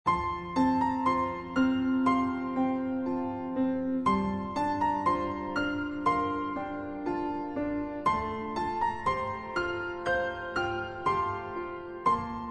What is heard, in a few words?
Alarm Overcast Background, 天气动态闹钟dynamic alarm; Xiaomi MIUI 12.5 China; 阴天Overcast; MIUI12.5系统音效全收录